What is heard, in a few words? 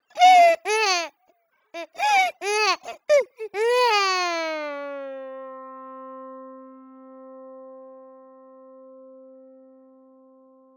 cry piano